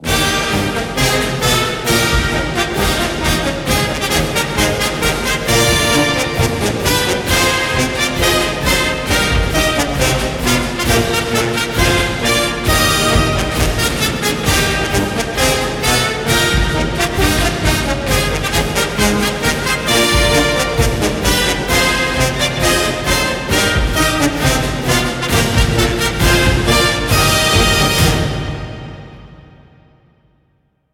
Broadcast Outro; NCAA Basketball 09; PS2, PS3, Xbox 360

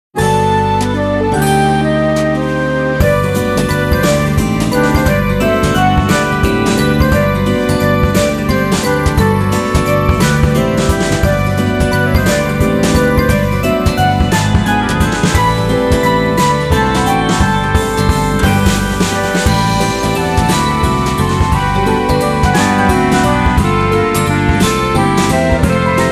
Music Success event

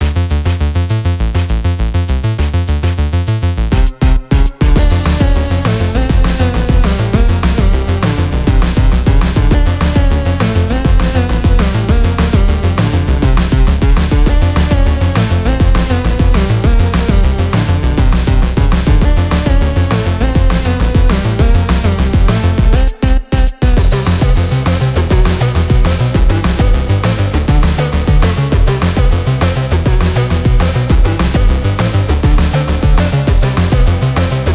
Level Chase

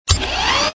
step

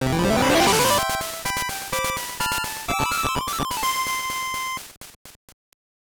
Jingle; Dino Breeder 2; SGB

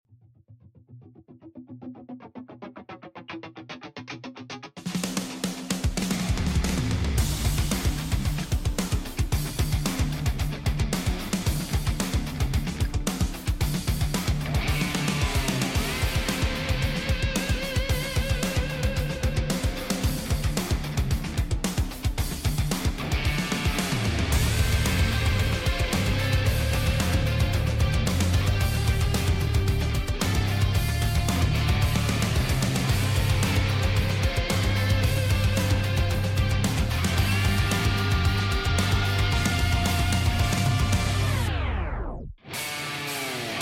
Battle Forest; Edgelords

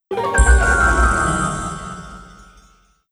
Jingle - Locked Room Recreation Start; master detective archives rain code original soundtrack 2023

